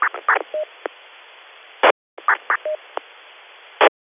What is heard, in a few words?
FMS BOSFunk